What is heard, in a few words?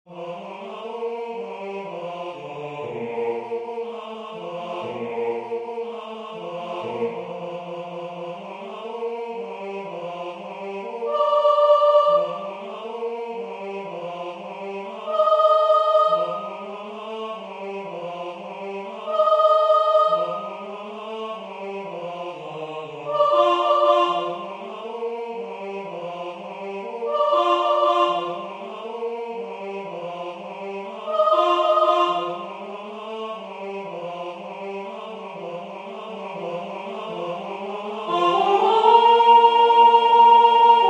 sopranos; eh toto